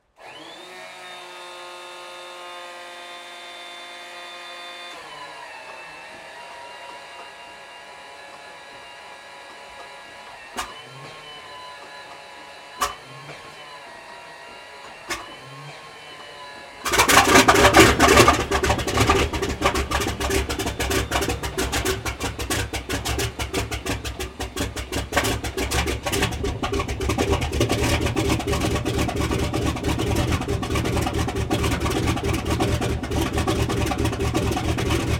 AIRCRAFT LOCKHEED HUDSON STARTUP, Hudson twin engine light bomber; 1941; Airplane Sound Effects; Airplanes (Sound Effects:Vehicles)